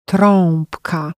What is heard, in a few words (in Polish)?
trabka